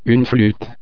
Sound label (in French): flute